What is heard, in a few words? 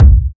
rich low end kick drum single hit c key